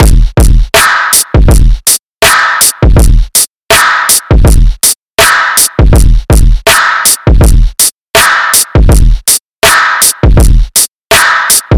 162 BPM Dm

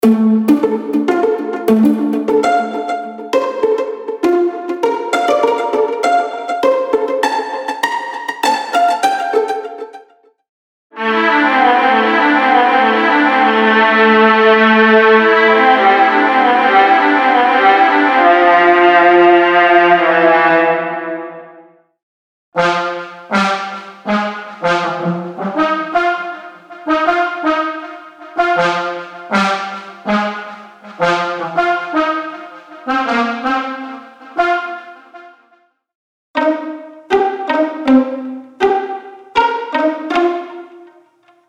Melodies Cinematic Orchestral

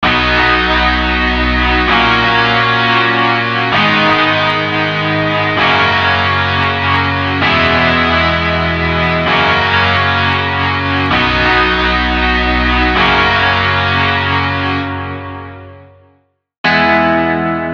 Rock Metal

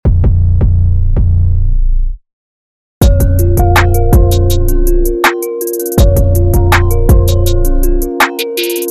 Aggressive

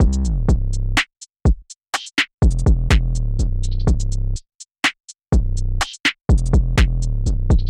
Drums; Zen Master Demo

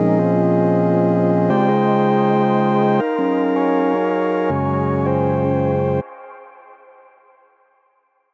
Drum Bass; Hybrid Standard; midi wizard

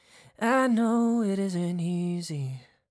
Vocals Warm

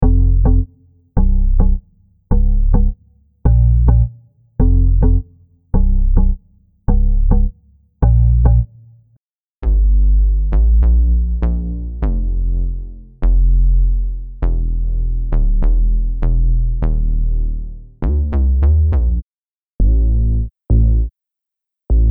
Reggaeton

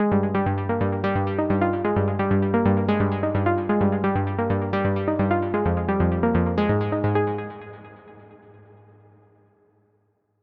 Melody Pluck Techno; midi wizard 2; Unison

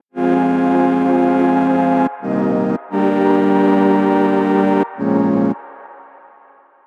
Chords Full Drum Bass; midi wizard

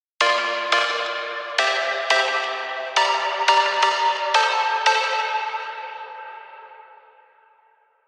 Chords Rhythm Drum Bass; midi wizard 2; Unison

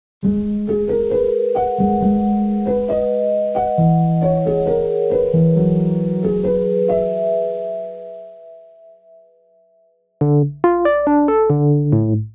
Melodies RB

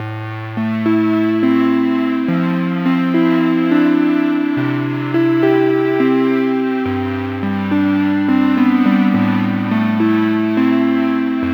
Ambient Downtempo; midi wizard 2; Hybrid Standard

